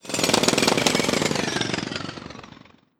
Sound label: Gnome Jack Hammer